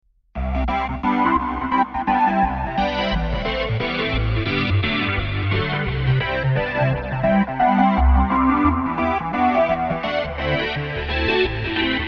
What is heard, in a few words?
Attak Pad